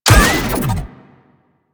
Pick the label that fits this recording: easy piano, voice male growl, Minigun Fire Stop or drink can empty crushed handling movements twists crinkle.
Minigun Fire Stop